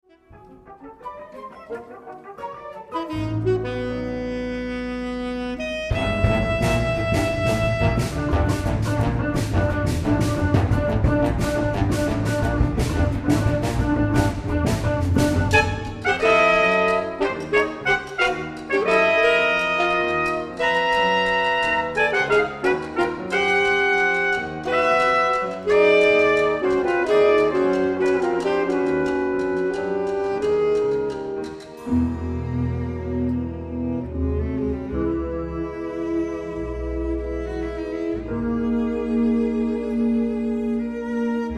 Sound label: Fullhorns